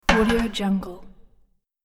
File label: metal drum barrel